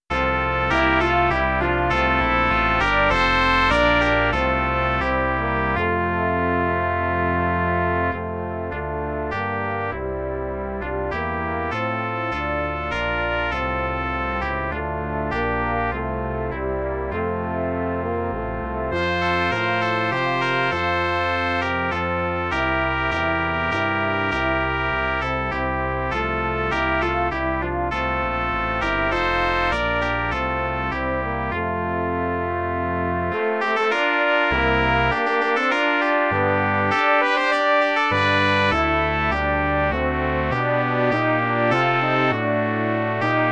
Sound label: Brass Quintet; America